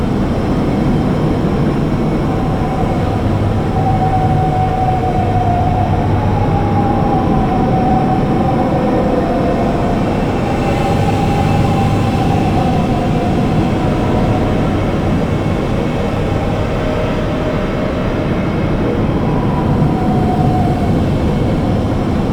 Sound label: Shipyards Interior Ambience; Warframe; Grineer Shipyards